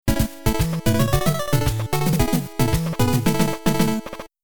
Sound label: Jingle